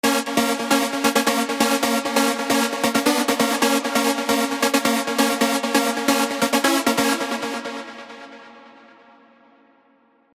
Machines Awake, rave stabs; Spire; revealed

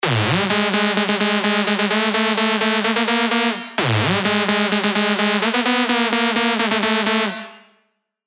dirty dutch leads; Corput Enters; Revealed Spire Dirty Dutch Leads Vol. 1

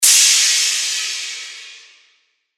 Crash, cymbals; revealed cymbals vol 1

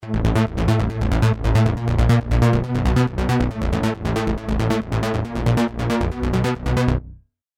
Flamethrower, techno bass; revealed diva techno bass vol 2

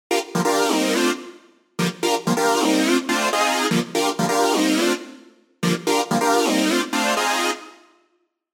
serum 2 house stabs; Shall We Begin; alonso saloon serum 2 house stabs

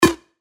Gulp, percussion; revealed percussion vol 3

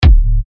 TECHKICKS D, techno kicks; Shinsu; Revealed Techno Kicks Vol. 10

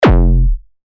BSHOT F; Paddy; revealed bass shots vol 4

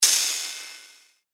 FX Hat, cymbals; revealed cymbals vol 1

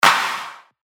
Clap; Blitz; revealed claps snares vol 2

